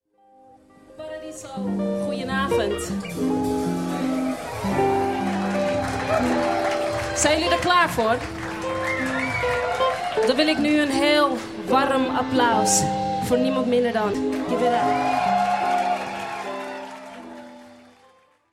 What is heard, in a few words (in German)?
warm aplaus